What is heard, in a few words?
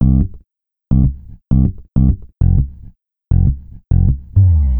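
Modern, bass sample funk; бас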